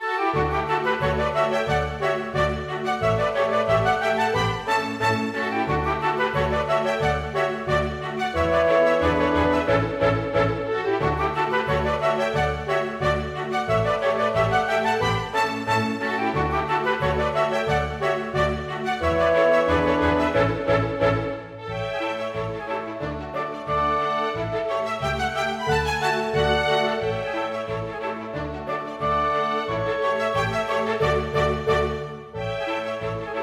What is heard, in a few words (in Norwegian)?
Polka for orkester; Schjelle